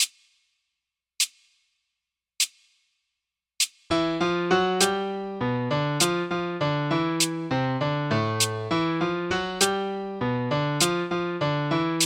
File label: changui slow